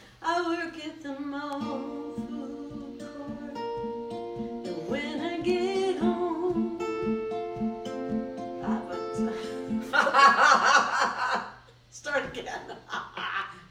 country radio

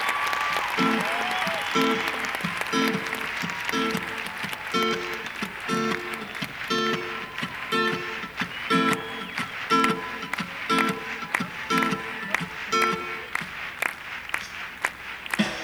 reggae interlude

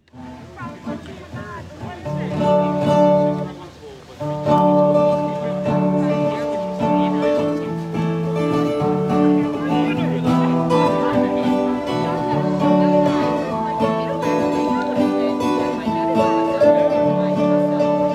soundcheck

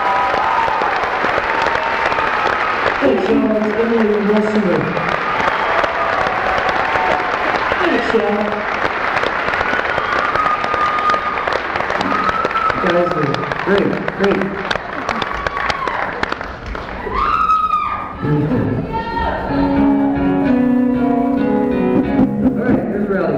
crowd; canberra theatre; canberra, australia

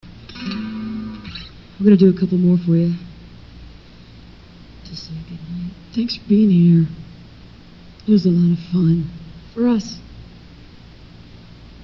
crowd talk stacks of beer

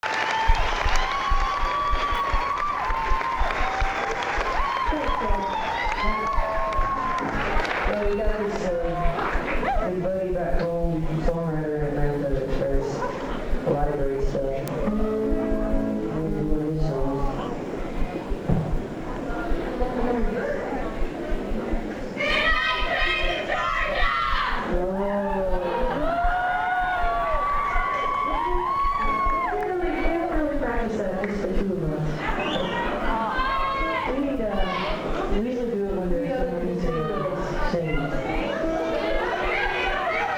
crowd; adelaide, australia

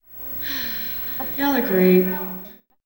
crowd talk y'all are great

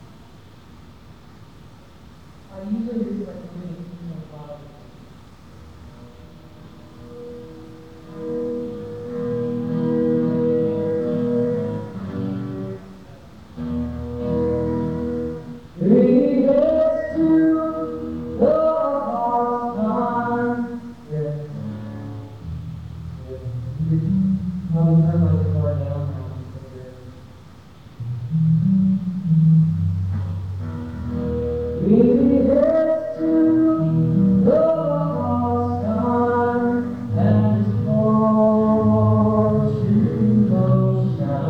three hits soundcheck; hammersmith apollo; london, england

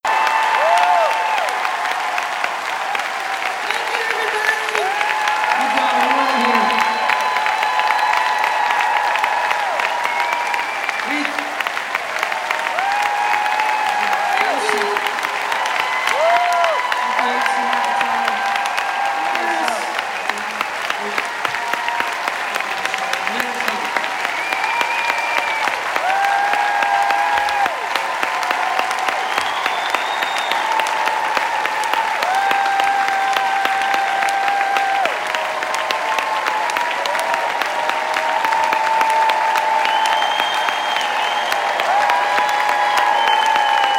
applause; durham performing arts center - durham, north carol

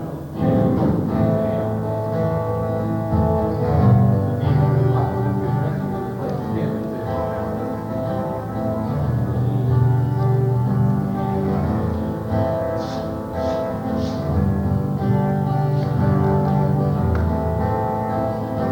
soundcheck

